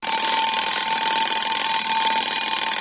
Clock Alarm Loop Win, alarms

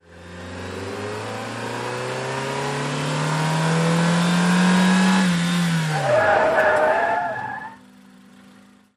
Sm Car 180 Rev Skid, compact

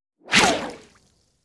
Bullets Slice Water

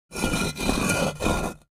Cinder Block Rocked, scrapes

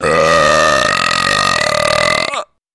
Gigantic Throaty B, belching

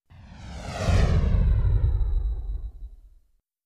Deep Whoosh By, whooshes

